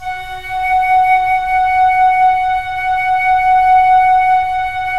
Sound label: FLT FL, Flute; Roland - LA Composer vol. 4